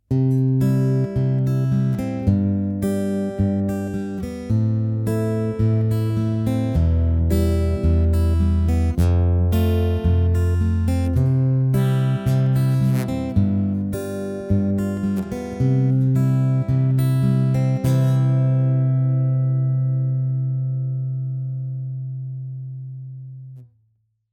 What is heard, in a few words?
Folk, begleitung